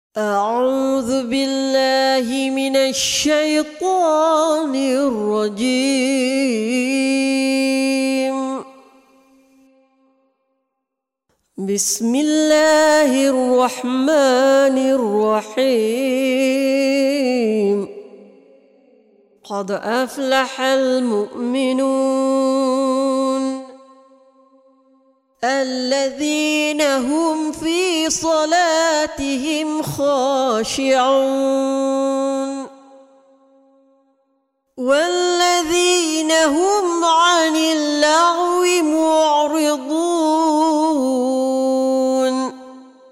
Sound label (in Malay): Surah Al Mukminun (ayat 1-5); Quran